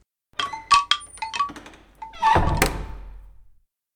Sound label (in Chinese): 关花质木门; 环境音; 非循环音(SE); Eustia